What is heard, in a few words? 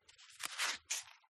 Book, Paper, Page Turn; PMSFX